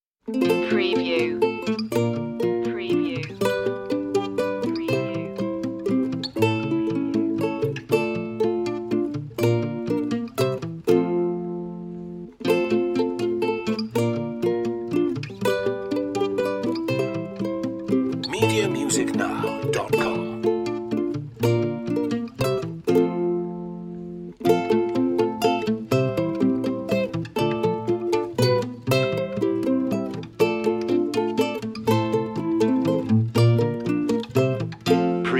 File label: we wish you a merry christmas ukulele underscore